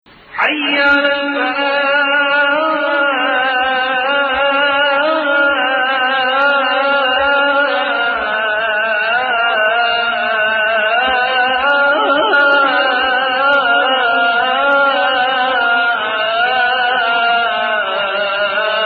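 Hijaz Adhan Bayati, Adhan (call to prayer), both step below & 5th scale degree included; Bayati 1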